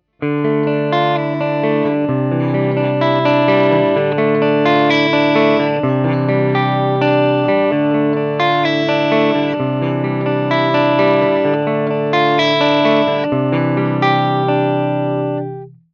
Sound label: Clean